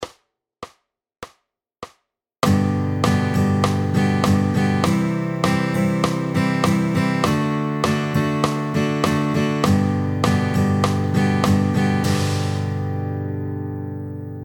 100 BPM, Rock & Pop; oefening 6; 8ste noten in een standaard pop/rock ritme

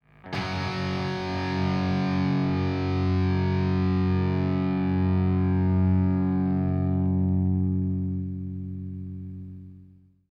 power chord

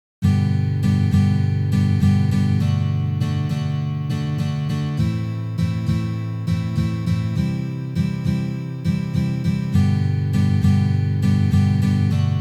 strumming pattern; strumming combi; "standaard"

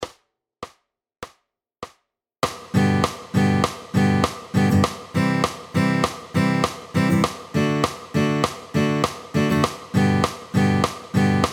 100 BPM, Reggae & Ska; oefening 7; spelen op de off-beat, dus de 2e en 4e tel

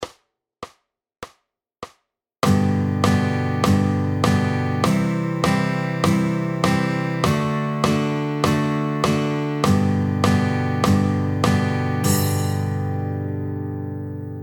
100 BPM, Beat Downstroke; oefening 1; speel een downstroke op iedere tel in de maat

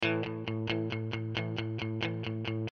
power chord

